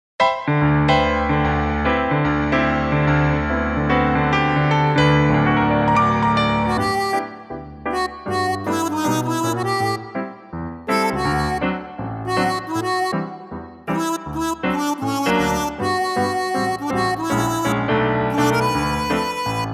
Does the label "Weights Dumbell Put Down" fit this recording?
No